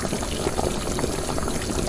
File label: boiling cooking sound over stove top